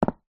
household pepper mill wooden large set down table

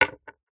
food sweet candy boiled set down on wood surface

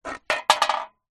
drink can empty down on concrete ground

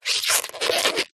foley rubber squeak movement; zapsplat

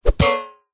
impacts swoosh into metal thud hit

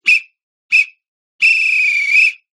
whistle final soccer; sport

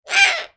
animals bird owl european eagle owl squawk; sound effects one

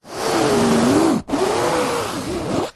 household blind fabric rub against

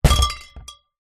box bottles wine glass kick; zapsplat

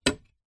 foley metal chair tube small set down concrete

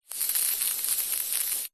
leisure can silly string spray

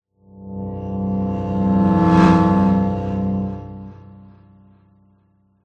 transition passby

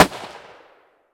gun tikka t3 battue 308 Swpph Shot Strong

